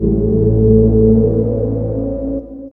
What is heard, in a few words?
SYN-FX